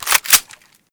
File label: bolt pull, weapons; mas scar; cw20 extras